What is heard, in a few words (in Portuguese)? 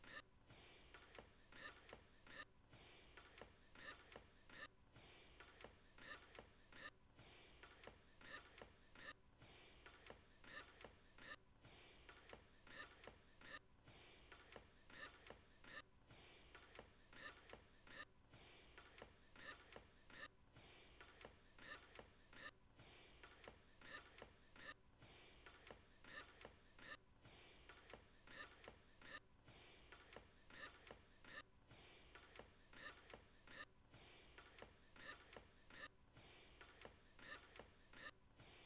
voz violino